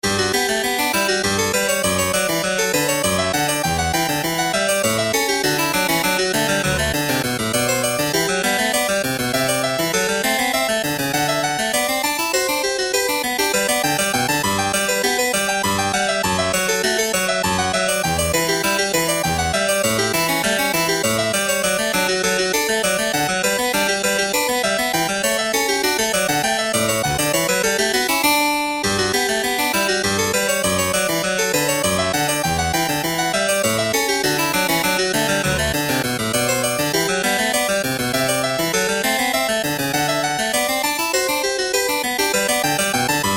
the goldberg variations var 1 johann sebastian bach virtual harpsichord vst plugin